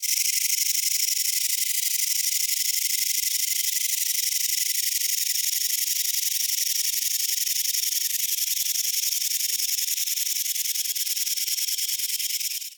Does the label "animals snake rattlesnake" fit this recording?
Yes